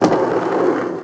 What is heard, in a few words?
Skate Boarding